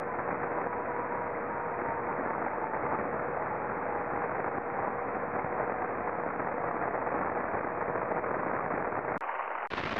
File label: ISS test